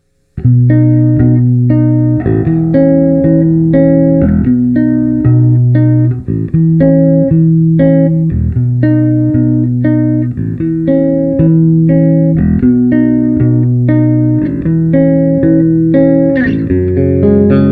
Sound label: raw chord track; berg ae112; ae 212 cabs